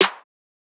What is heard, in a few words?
Snare